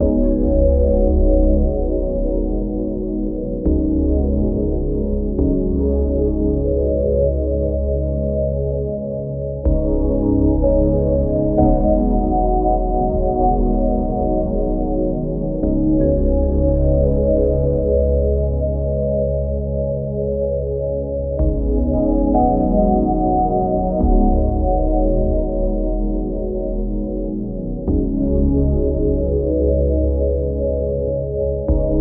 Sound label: ambient calm soft pads; chill